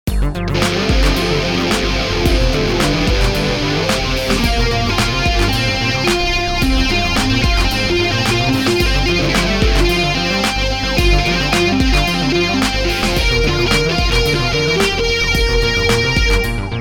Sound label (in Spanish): musica